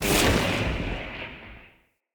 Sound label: Tesla Mini Game Charge; Encounters; Ode Pollution Tower